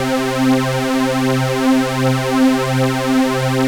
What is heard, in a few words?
juno big lead